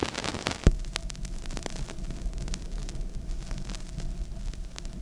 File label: Vinyl, noise; layering